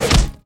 candy hammer; Adventure Time - Elemental; ThrillGames

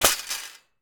stand drop metal; Beano - JJ's Wheelie Big Challenge

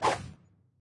move; The Powerpuff Girls - Trail Blazer; NextlevelGames

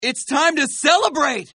win; leo

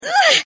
hit; Power Rangers vs Teenage Mutant Ninja Turtles - Ultimate Hero Clash 2; power april

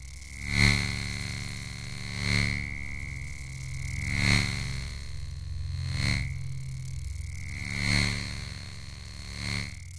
laser deflect loop; Ben 10 - Omnicode

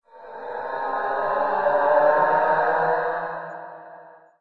ghoul; Be Cool Scooby-Doo! - It's Dark Out There; ThrillGames

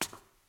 footstep stone; Ben 10 - Omnicode

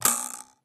empty; Adventure Time - Angry Betty; ThrillGames

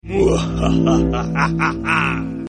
win; Power Rangers vs Teenage Mutant Ninja Turtles - Ultimate Hero Clash 2; galvanax

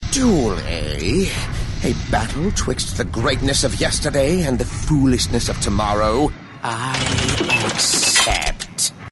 New Wave; Ben 10 - No Arm Done

